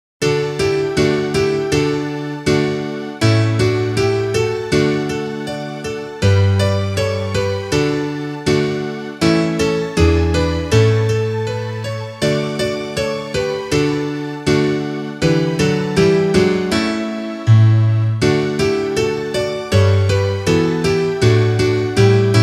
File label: instrumental